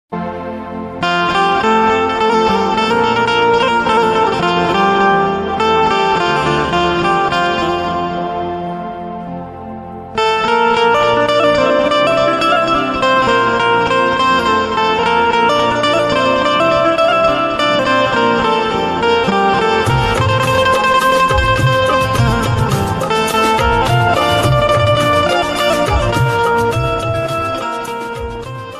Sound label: Bollywood Instrumental Ringtone; ringtones